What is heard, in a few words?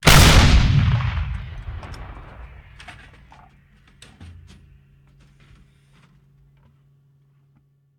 Artillery Cannon Artillery; Sound Ideas; Guns